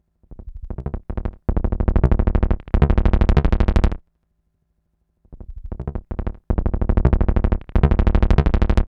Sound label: BAZE, BASSLINES; Masterbits - Tekkno Trax